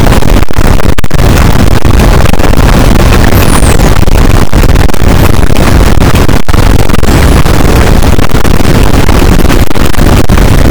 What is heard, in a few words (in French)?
cachalot positif, boat; Physeter Macrocephalus